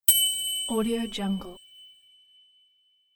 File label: ding